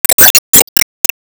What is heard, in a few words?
Airdrop Generate